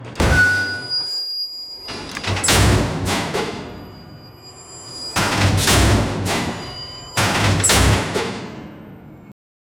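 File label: sound of a massive industrial, airlock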